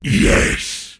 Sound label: last hit pain; pudge